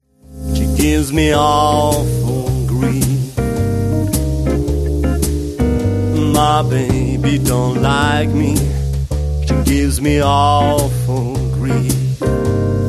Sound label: soothing